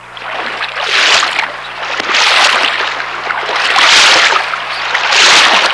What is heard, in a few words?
Stepping Through Water; horror; horrorgifs